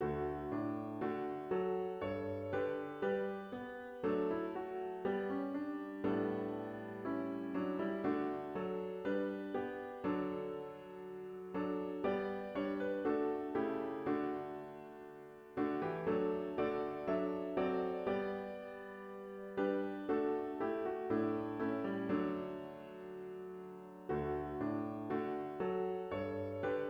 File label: Piano; Reverence Medley